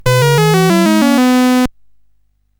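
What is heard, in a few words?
Number Tumbler, MOS 8580 SID chip music, games; CSG8580R5; hvsc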